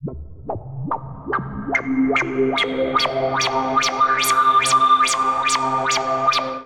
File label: Synth A Minor 144 bpm; MIDI Clips; Ableton; Skitter and Step; Power On